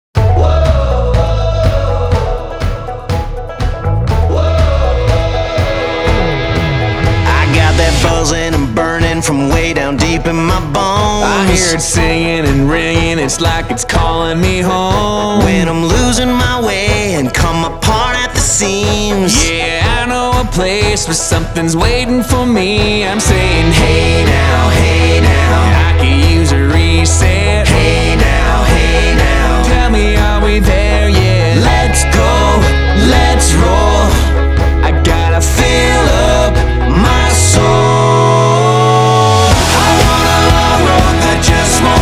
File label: Country Thunder